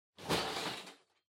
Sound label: Loose Fill Packaging Far Hand Out Strong; 梱包材